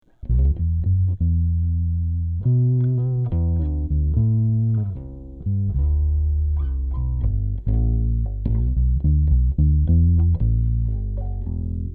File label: Em Improv; no EQ, compression, or effects; Epi Rivoli